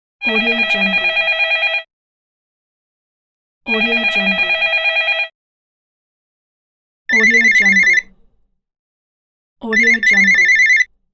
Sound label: phone pack; kuksaudio